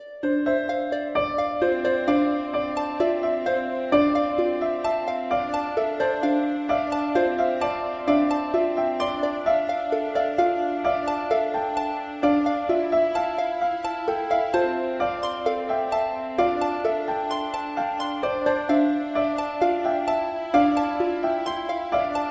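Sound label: tranquil soft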